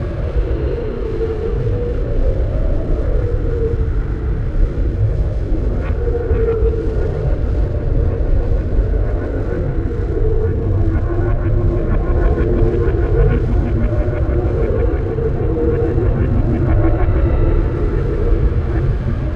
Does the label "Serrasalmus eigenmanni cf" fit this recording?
No